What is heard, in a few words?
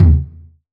909 Tom amp; Goldbaby Tape808 & Tape909; The Tape 909